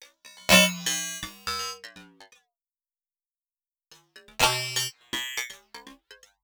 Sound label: Drum Fx Loop, Electronica